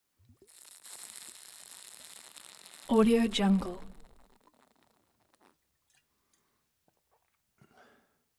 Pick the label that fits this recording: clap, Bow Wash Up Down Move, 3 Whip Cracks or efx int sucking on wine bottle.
efx int sucking on wine bottle